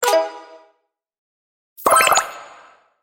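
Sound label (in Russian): эффект бомба в мультфильме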